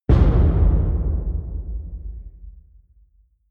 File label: wood hit large table